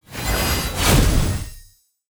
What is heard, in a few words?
Level Up; mighty party 2017 gamerip pc ios android